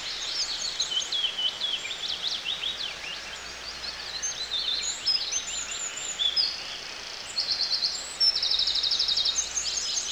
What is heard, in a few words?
Grass Ambience; super perspective; Perspektif